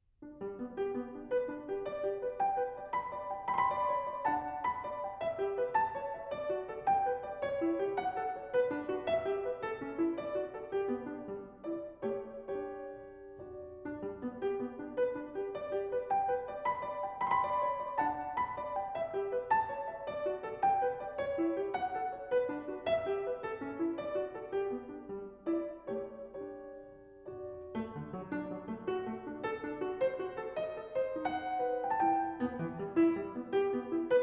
Partita no. 5 in G major, BWV 829 - Tempo di Minuetta